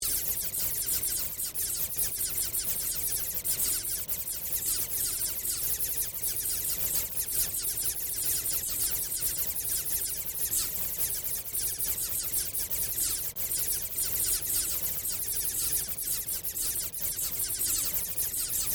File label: Created Ambience Electro Bats, ambience created through sound manipulation; Artificial; Various ambience created through sound manipulation in FMOD